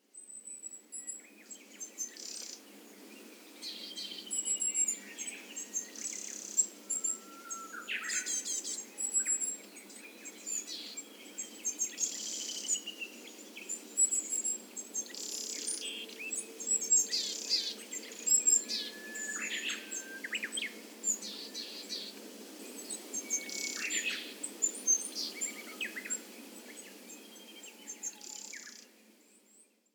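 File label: Willow Tit; koe; kogara; kobe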